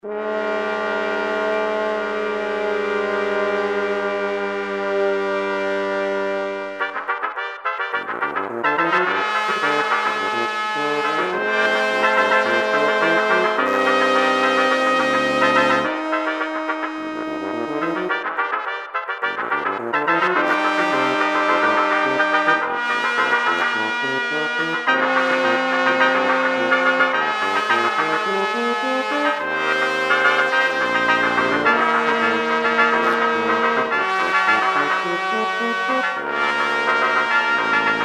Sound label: Trumpet Song